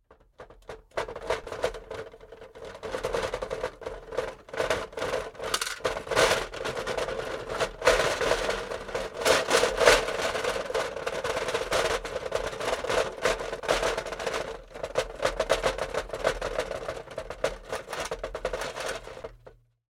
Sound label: toys tin lego shake rattle earthquake